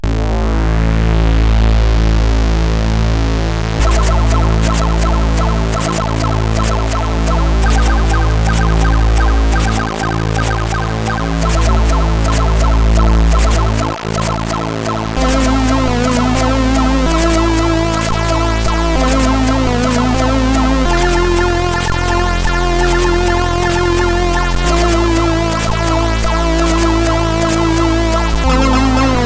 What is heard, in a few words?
Sludging tune sid CSG8580R5